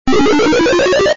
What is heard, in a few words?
Vine growing; Super Hermanos Mauricio